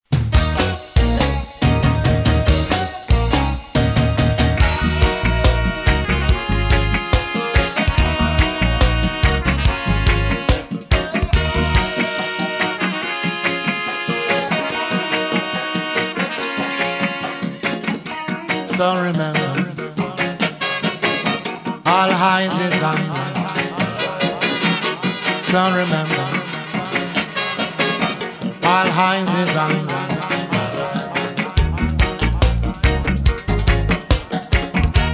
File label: dub